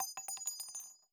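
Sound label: bullet case bounce 50bmg cement; gmod; viper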